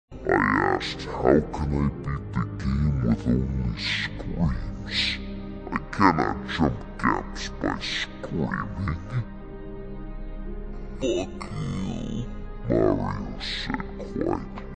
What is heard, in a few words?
screams